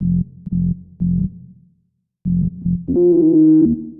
120 F Vowely, Analogue Bass; Loops; Touch Loops; CosmicFunk

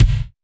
BD GATE; drum; BASSDRUMS

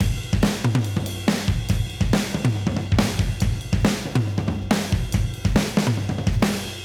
Modern Breaks; Touch Loops; ClassicBreakSessions

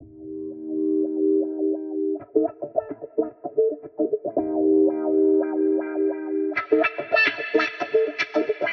110 C F Riser, Spaced Out Guitars; Loops; Niles Riser; Touch Loops; CosmicFunk

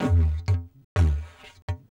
ethno, 125

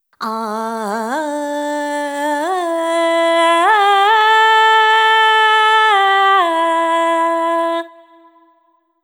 ETHNO FEMALE, Vocals; ethno2

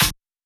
clap snare; Anthemic

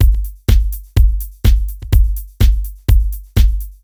DEEPHOUSE BEATS 125

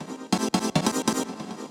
TECHNO SYNTH 140; Keys

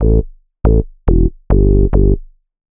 ELECTRO BASS 140 A

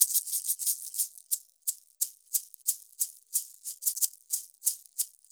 ETHNO EXTRA 90; ethno2

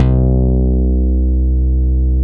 BASS MONO

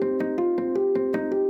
ethno, 160, C

